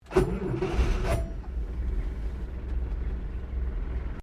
CAR HOLDEN FJ IGNITION INTERIOR, Classic Australian cars; Car Holden Fj 1955 Ignition Interior; Cars (Sound Effects:Vehicles)